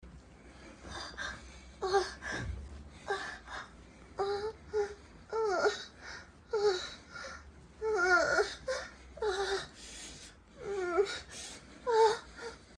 sex, moans; The Witcher Hunt

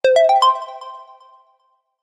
Correct; Treasure of Nadia

